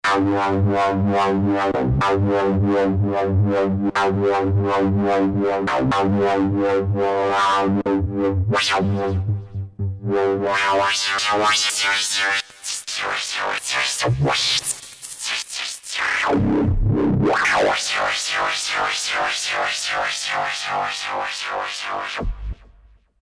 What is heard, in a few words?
Beefy, Novation XioSynth; Novation XioSynth 25